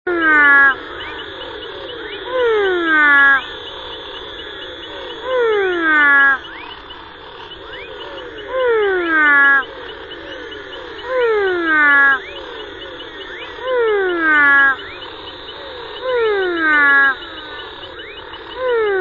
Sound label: Pleurodema fuscomaculatum